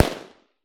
Gunshot High

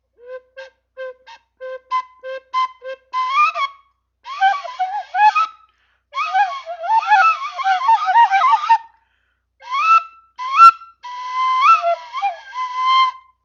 Cheap Flute

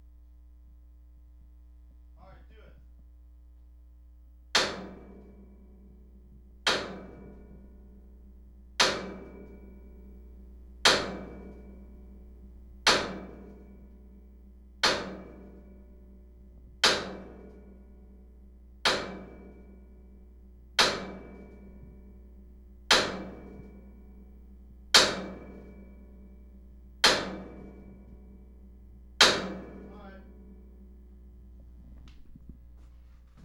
Metal Bang

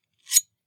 Knife

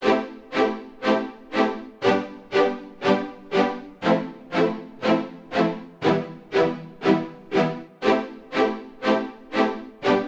Natural Strings Chord Progression Am 120 Bpm

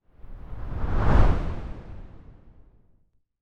Swoosh

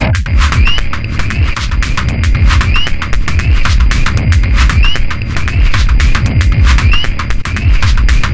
115 Bpm Stab Loop

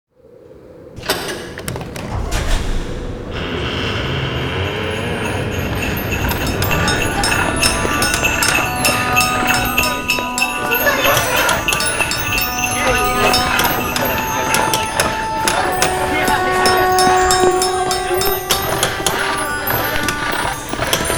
Santas Workshop

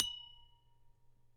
Glass A pp

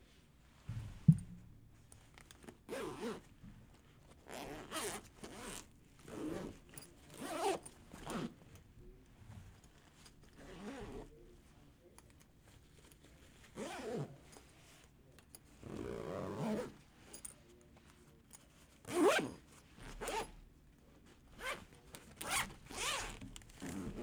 Zippers On Backpack

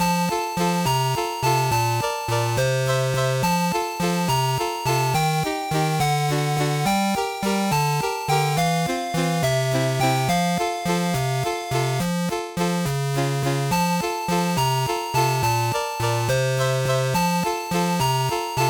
8 Bit Water Stage Loop

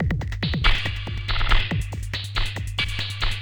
Drum Loop

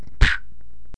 Punches

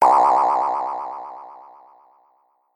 Funny Boing

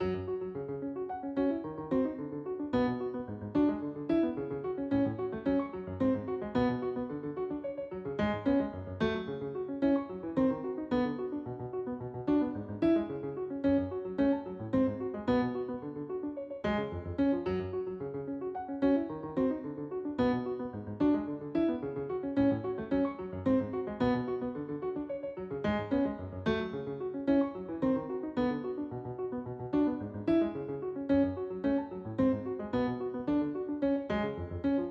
Piano Loop

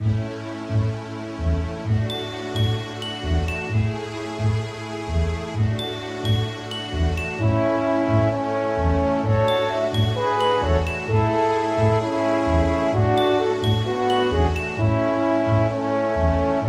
Soft Game Theme Loop

